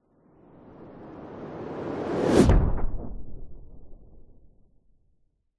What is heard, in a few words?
sound design cinematic whoosh fast into collapsing air tail; zapsplat